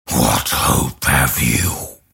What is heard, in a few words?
cast pain; Grimstroke